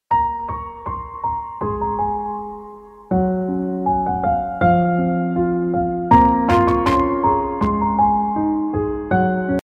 Soft Tone; Ringtones

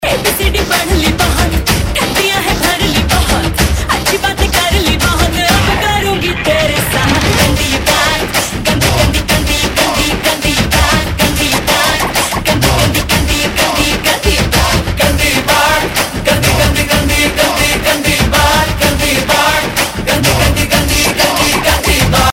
Female; Bollywood; R Rajkumar

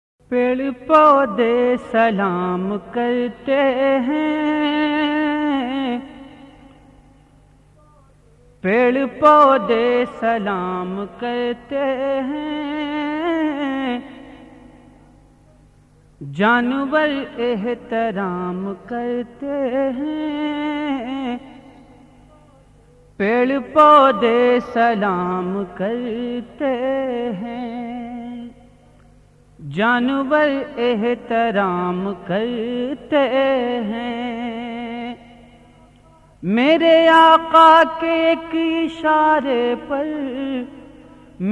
Pad Poudha Salam, Naats; Islamic Qawwalies And Naats

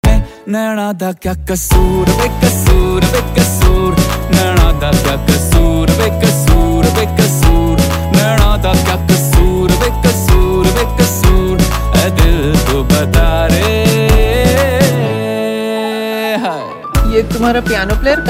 Ringtone, Bollywood; AndhaDhun

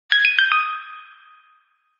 google nexus 4

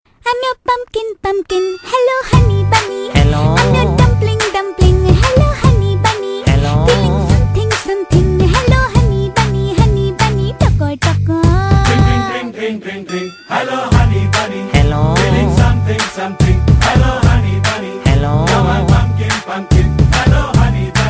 Tone Bass Mix

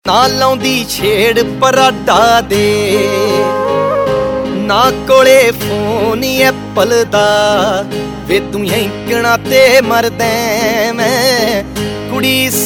Ringtone, Punjabi

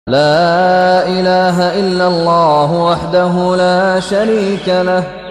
Islamic Sms, Ringtones

